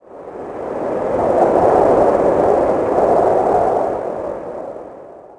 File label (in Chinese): 风声; 红色警戒2; 环境音效